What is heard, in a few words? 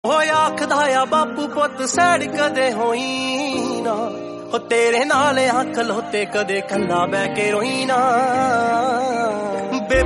emotional punjabi